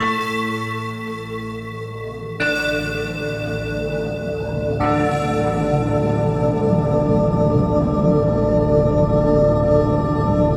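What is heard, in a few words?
Yamaha TX16w Aliasing Pad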